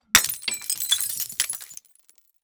Glass Break Beer Bottle; Soundopolis; Glass Smash; Fienup

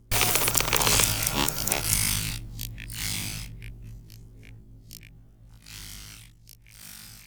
mechanism tiny bot layer, Robotic Lifeforms; SoundMorph

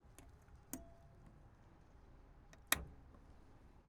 Zivko, Edge, 540, Button

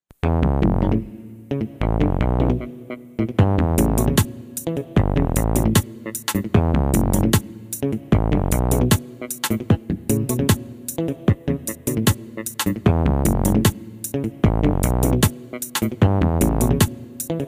Ragga, Reggae

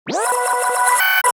Computer alerts; SoundBits

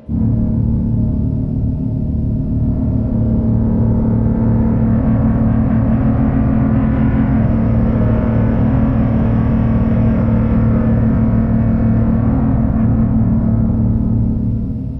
ATMOS, Cinematic Sound Effects; 99Sounds; Generdyn

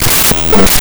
Rhythm

